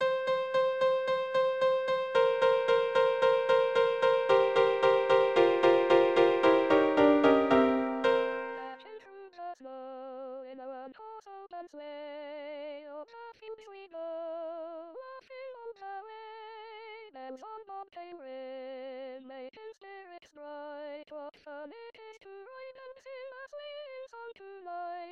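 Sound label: jingle bells, bass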